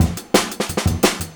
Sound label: 175, Breaks; EZRollers